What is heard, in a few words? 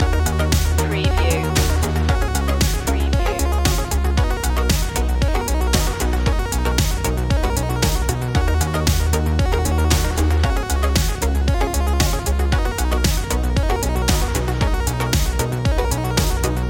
retrowave loop